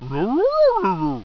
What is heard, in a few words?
ruhruh, animal